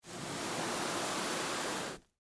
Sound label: Body Drag; Horror; Blood And Guts Sound Effects; Blast Wave